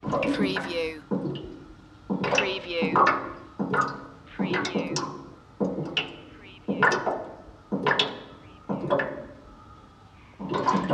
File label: radiator gurgling sound